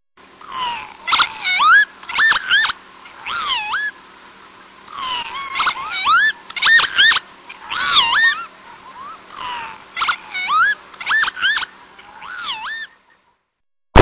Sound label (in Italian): pavoncella